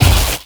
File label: arc emitter fire, weapons; Stellaris